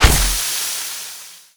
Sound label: Self Stab